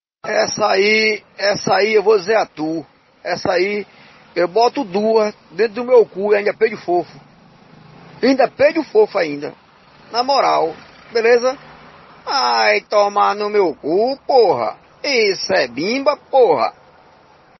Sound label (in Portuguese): peido fofo